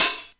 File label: 909 banging lofi hat; DRUMS (SINGLE HITS); Hats